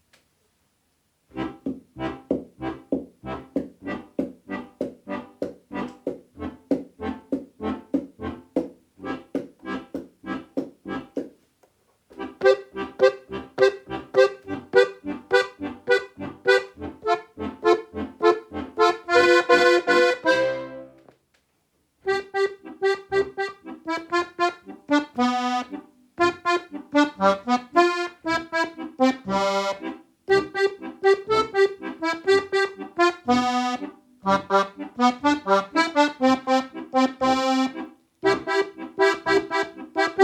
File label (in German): steirischen